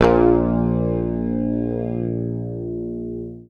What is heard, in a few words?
Bass; Sampleheads; Will Lee Bass Library